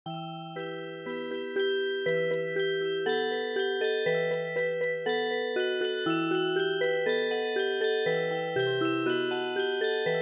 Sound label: Music Box, traditional; The Old Chishomlm Trail